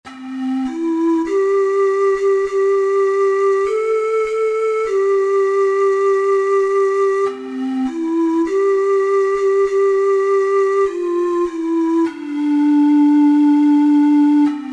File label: kumbayah, ocarina four hole